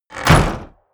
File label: door wood close